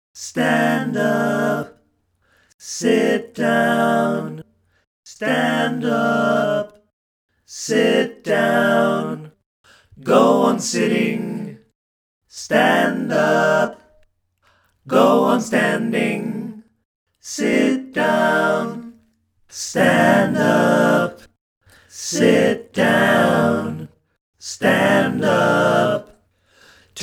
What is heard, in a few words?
standup sitdown song